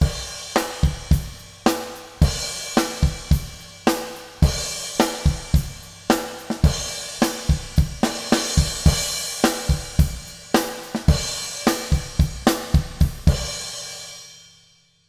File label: Reverb - Drums - Ambient Sand; CRTIV Reverb